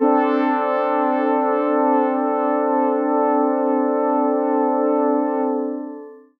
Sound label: PAD Computer Day Woolyness